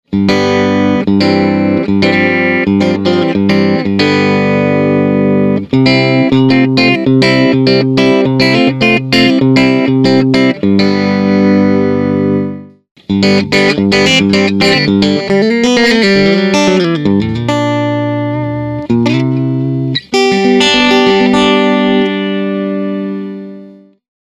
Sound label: chords off on